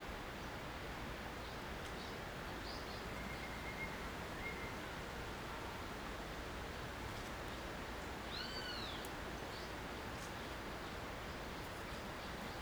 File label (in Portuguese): Rio vermelho longe trilha estrada real goias velho com passaros e estalos